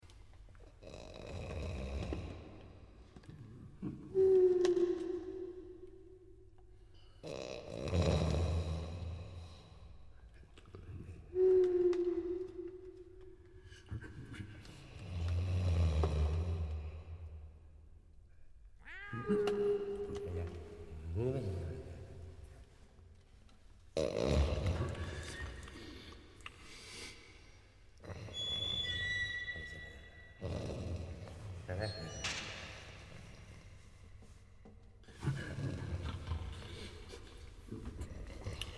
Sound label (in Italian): ambiente museo